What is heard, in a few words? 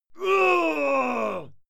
male fight scream pain